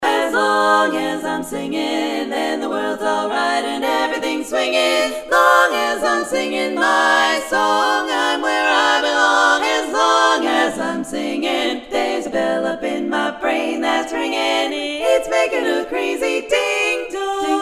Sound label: singing